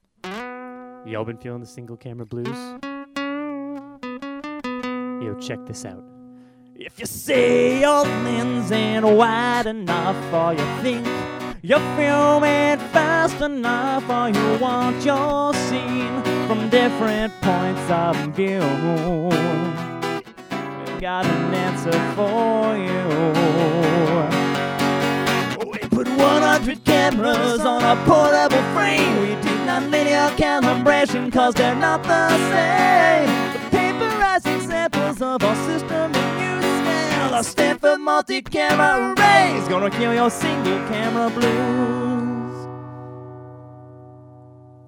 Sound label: single camera blues